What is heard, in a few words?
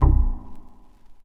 Polysix Bass Pluck Verb Vinyl Synths C3; vinyl perc